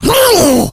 rage, infected, male

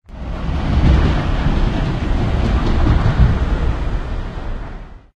train 1shot, harbor; Source Engine; tf; cp harbor

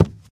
wood, footsteps, walk; player; source engine; left 4 dead 2; boomer

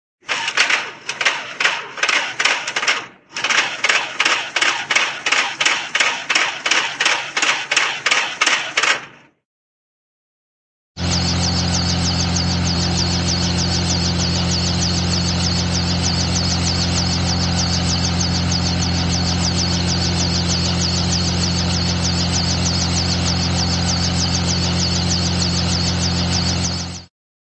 helicopter starter only helicopter slow idle; cartoon

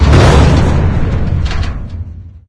fan spin long; ambient; source engine; ep2; outland

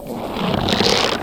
barnacle tongue pull; Source Engine; video game; npc

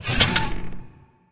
button up; video games; buttons; source engine; portal2

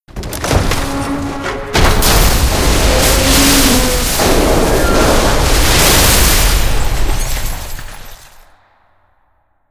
buttonwall collapse

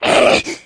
pain short, voice; video game; source engine; left 4 dead 2; jockey

